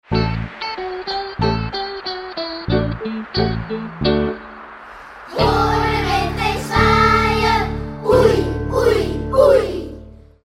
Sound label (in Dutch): hoor de wind eens waaien; Herfst; spel bewegen instrumenten wind spreektekst; Kleuter-wijs